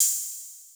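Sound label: open hi-hat, Drum Machines; AcidLab Miami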